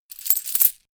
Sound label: Coin Rattle; Heavy rummaging with coins in metal jar.; Money Sound Effects; Lynne Music